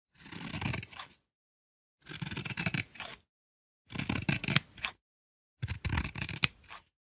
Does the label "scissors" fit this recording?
Yes